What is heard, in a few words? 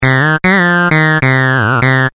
Keyboard Solo; rocktopus